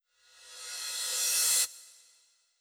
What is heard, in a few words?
cymbal reverse